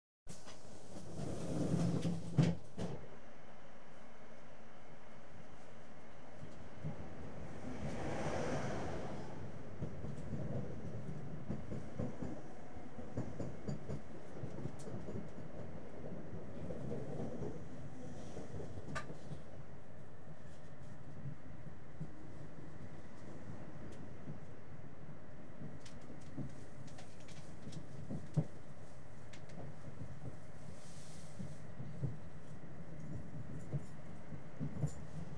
trains int sliding doors close, run, stop in station; Transport; BBC Sound Effects Library